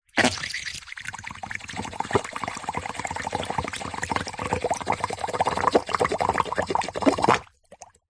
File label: leisure whoopee cushion deflate shallow water bubbles hiss long